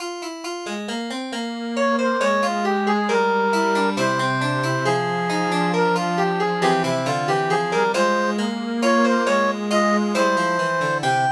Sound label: Cog Waltz; Bossbot Golf Courses